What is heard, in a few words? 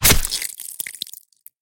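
making a smoothie with a machete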